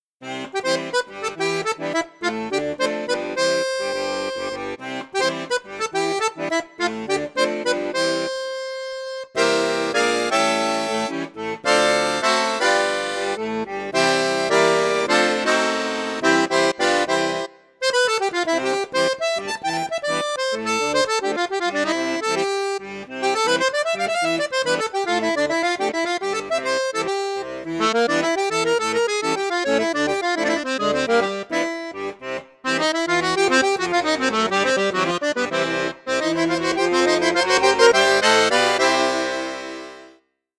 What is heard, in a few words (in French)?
registre flute juste piccolo, accordeon; cavagnolo professionnel 12